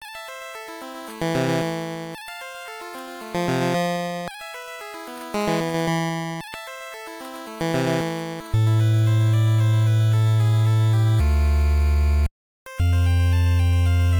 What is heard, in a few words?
Game Over; Déjà Vu; NES